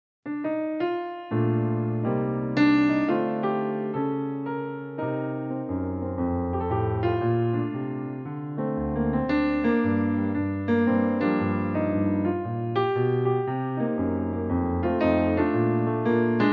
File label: Jazz